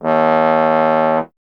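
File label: bass trombone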